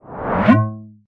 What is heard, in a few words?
characcter descend; Henry Danger - Day Of Danger!